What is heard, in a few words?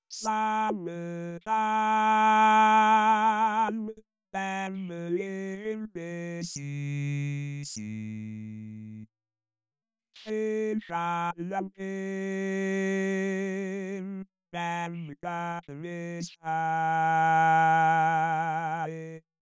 male jazz; KTH